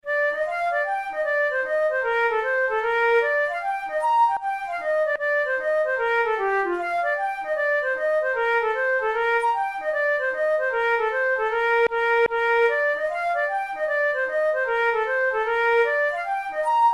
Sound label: hornpipe, flute tunes